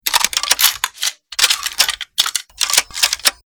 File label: vssk load, weapon; metrocraft